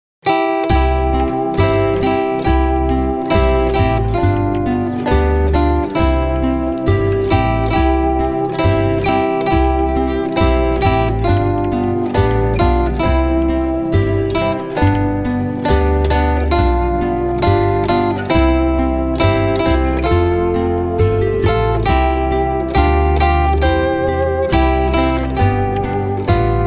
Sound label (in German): weihnachten, zither; weihnachten mit der zither